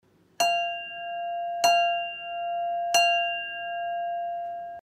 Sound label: Harmony Flower F5; Green